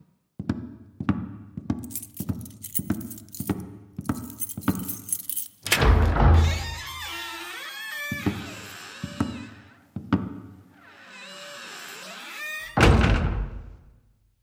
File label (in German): Foley Szene